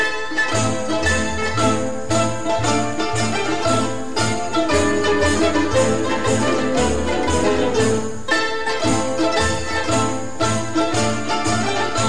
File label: tarantella